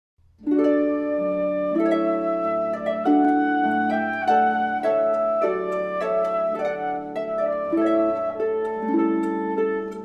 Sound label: Trumpet Voluntary, Harp and Flute; Clarke